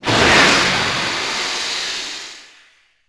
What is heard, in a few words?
torpedo fire; Civ4 warlords